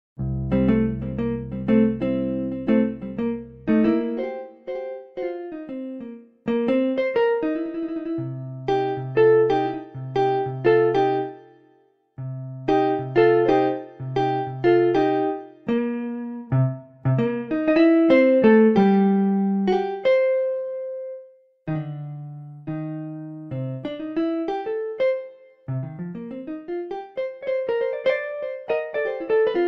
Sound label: Various Guitars